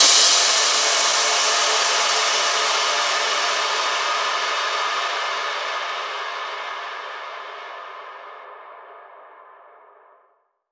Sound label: FX Impact